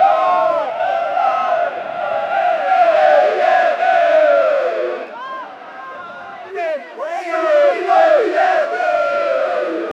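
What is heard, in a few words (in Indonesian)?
suara masyarakat indonesia, protest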